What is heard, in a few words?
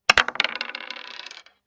dice roll